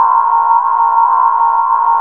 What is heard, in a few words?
DRONE INDU, Synth FX Misc